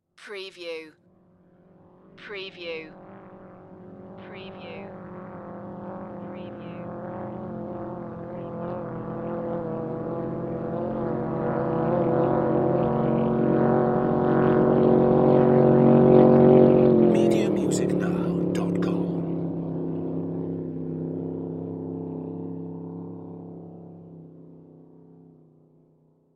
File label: Hydroplane Pass By; Sound Effects Bible